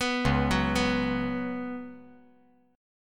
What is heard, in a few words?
C sharp 7th Suspended 4th C sharp